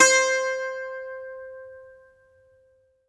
Greek Bazouki, plucked strings; E-MU Producer Series Vol. 7 – Old World Instruments

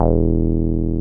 303 SQR E2 MF, SQUARE BS

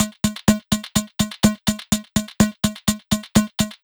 Hats, Drum Loops 125bpm, Nu Dance Electro; Zero-G; Soundclash Nu Dance Electro; Acidized Wavs; Sticky Icky

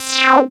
syn, Dance Synths, Wasps; ProSamples vol.37; Best Service

